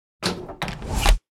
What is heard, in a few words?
Sci fi laboratory door air release closing